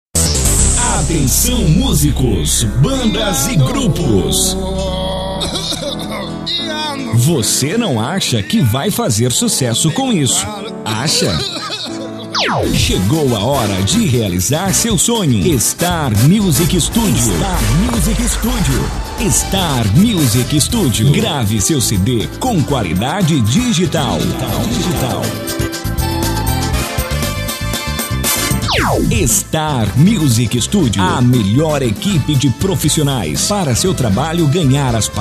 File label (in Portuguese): vinheta